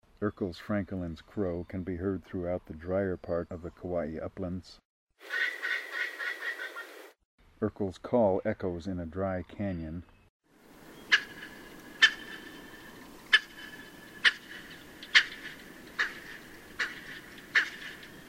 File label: Erckel's Francolin, Voices Kauai